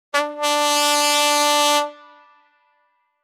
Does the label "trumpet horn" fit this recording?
Yes